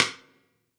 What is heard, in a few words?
P STICK, Drum Mashines; EdgeSounds; Drum Mashines VOL-1; PROTEUS1 DRM